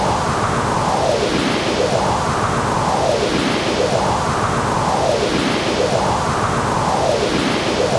Vento - Loop; Super Mombo Quest

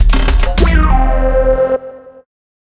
Efeito Neutro; Square x Square

